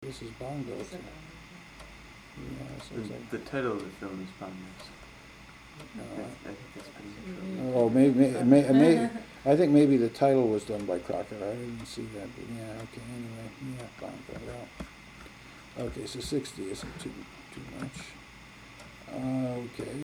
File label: bongo